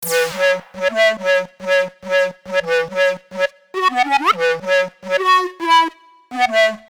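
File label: silly solo, 140 bpm, F sharp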